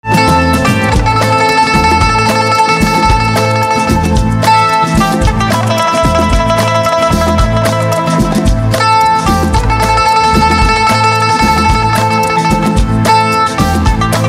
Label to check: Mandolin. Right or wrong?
Right